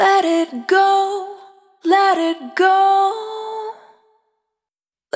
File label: Jericho, Single Voice Melodic Loops; Sony Creative Software; Pocket Diva World Class Vocal Samples